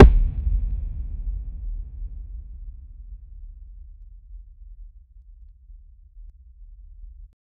football kick

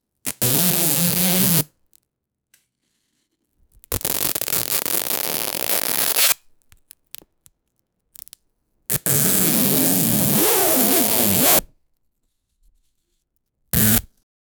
loud unrolling duct tape

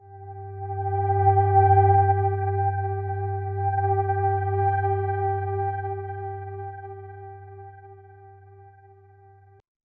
haptic vibration sound

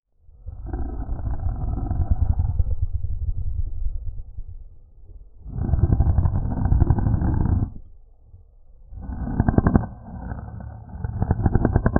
rolling marble; objects

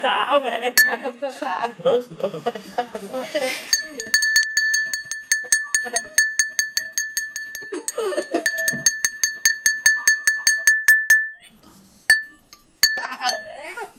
people are at a dinner, dining

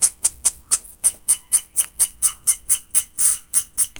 light frosting squeeze so, sprinkle

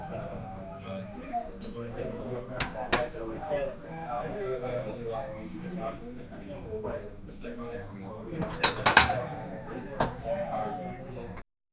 ambient diner sound

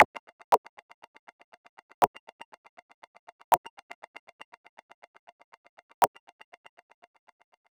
electronic or lo fi, plucks

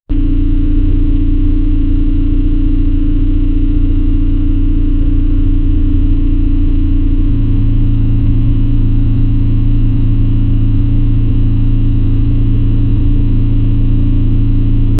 create a sound of engine, vehicles

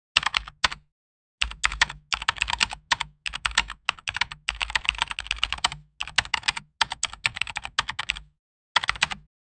mobile phone keyboard pre, mobile phone

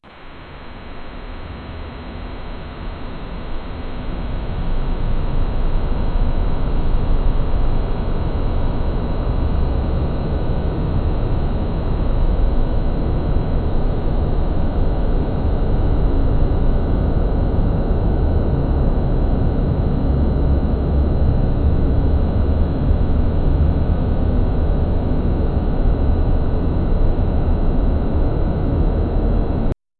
insane asylum outbreak

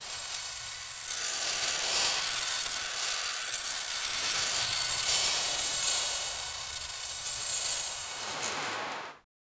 swing chain creak metal c

